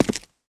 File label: concrete, footsteps; player